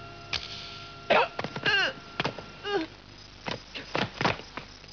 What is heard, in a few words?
Fight; 特酷声音素材库